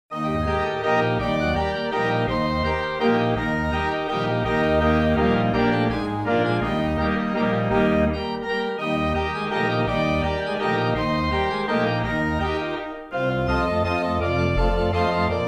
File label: Band Organ; Walnut Hill VTPO